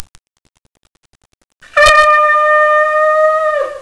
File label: shofar